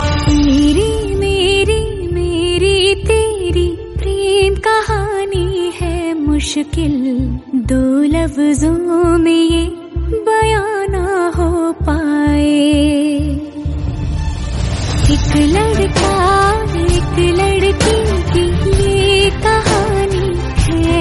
female

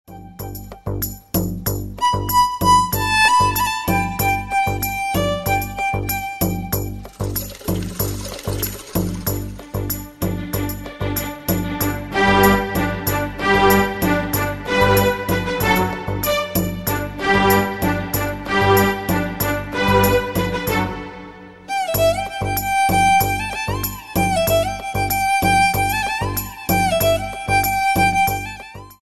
instrumental ringtone

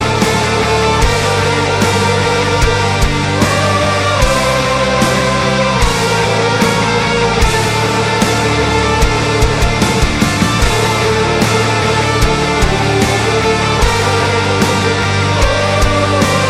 sweden rock ringtone

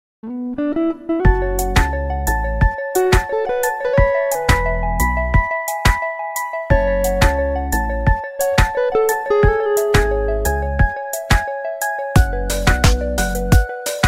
electric mix ringtone

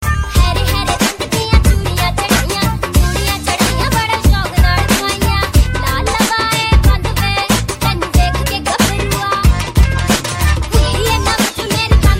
punjabi ringtone

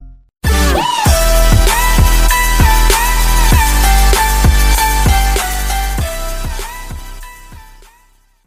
marimba

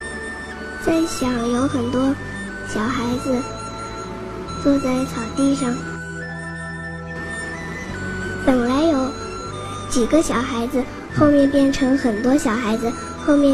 chinese songs ringtone

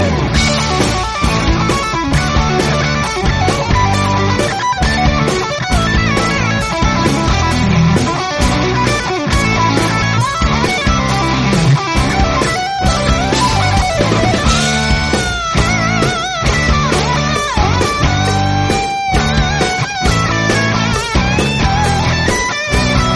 norwegian cowbell

